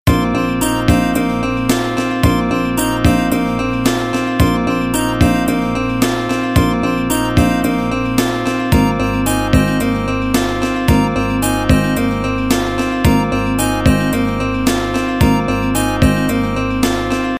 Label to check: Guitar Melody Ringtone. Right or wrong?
Right